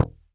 noise muted slap, swag bass

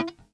a3 release, glowlybass

